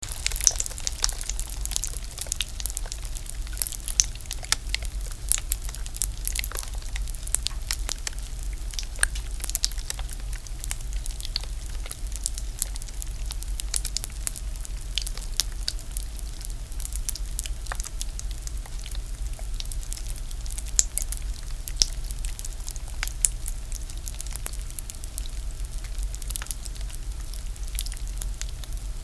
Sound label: ice